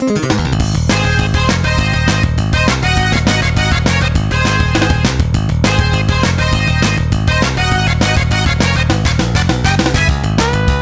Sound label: battle; Mario Kart Wii